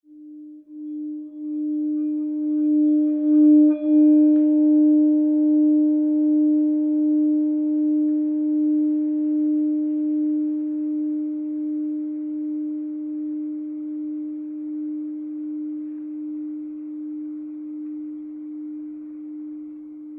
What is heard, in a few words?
japan black racko rubber